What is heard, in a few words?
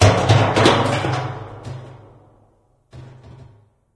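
metal randoms impact, ambience; video games; source engine; portal2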